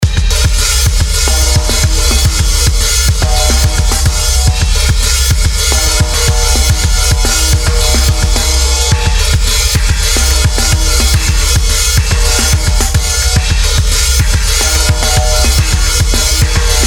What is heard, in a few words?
Destruction Beats